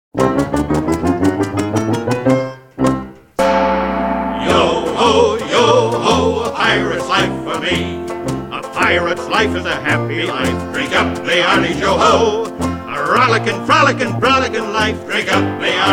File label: With Vocals; music; Pirates Of The Caribbean